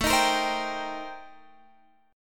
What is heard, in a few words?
C Minor 7th A; Cm7/A; strummed